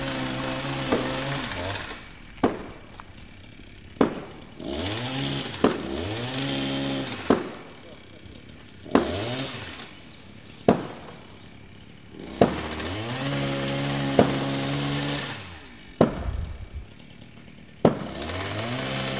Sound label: construction; Golden Crayon Sounds